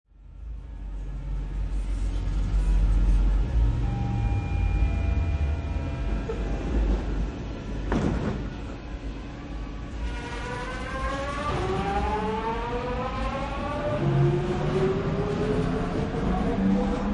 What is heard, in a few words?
Subway Detroit People Mover Pulling Out Of Station, Subway train / underground train; Subway train / underground train: Detroit People Mover, start from a standstill and pull out of statio